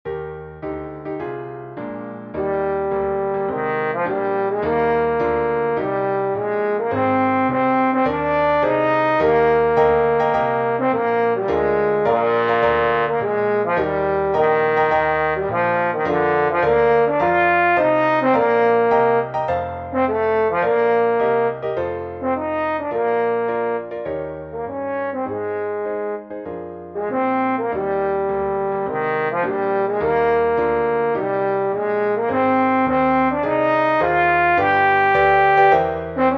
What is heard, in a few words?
Trombone; Contest Piece for Trombone; Trombone/ piano accomp.